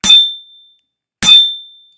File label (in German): Fahrradklingel Apfel